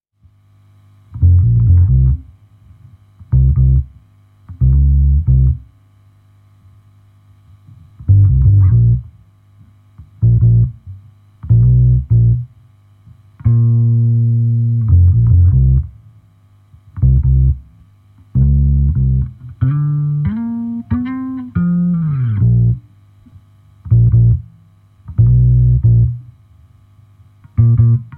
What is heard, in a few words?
Bassline (Stem)